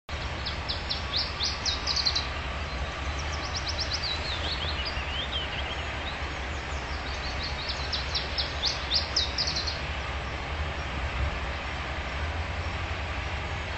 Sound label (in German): iberischer zilpzalp